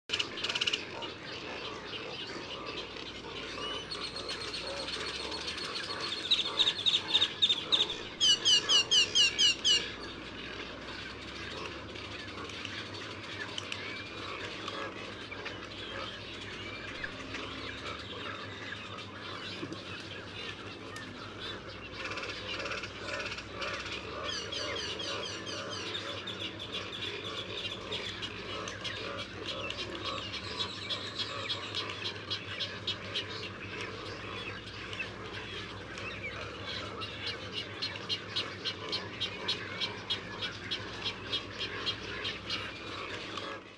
Spoonbill, كفچه نوک

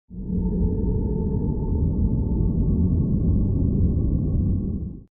Foggy environment

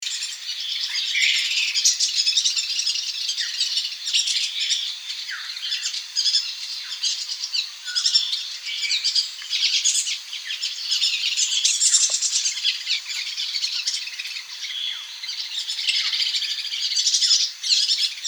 Striated yuhina, پرنده یوهین راه راه

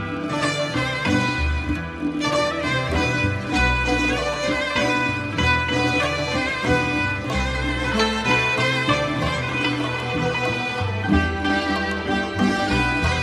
Primrose Ringtone, زنگ گیلکی

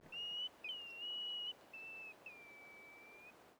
Grey plover

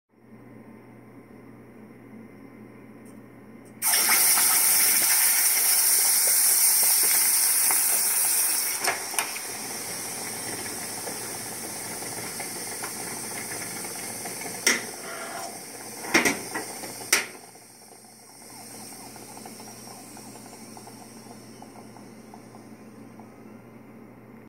Hot iron in water; آهن داغ در آب

